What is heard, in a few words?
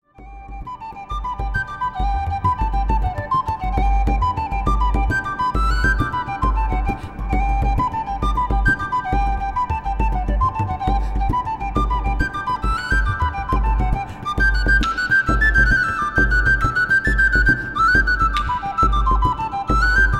irish jig